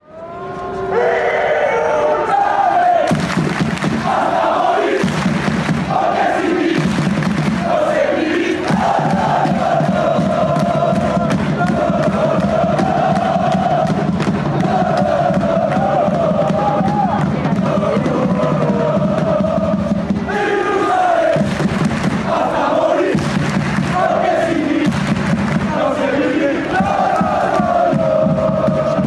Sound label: chant, fanchants; osasuna